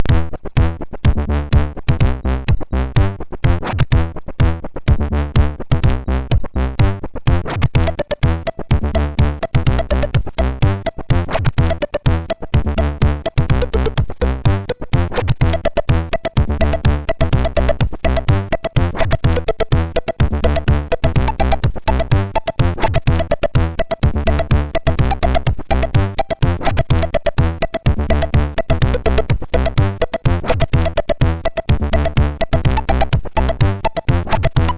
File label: Human Box, MOS6581; MOS6581R2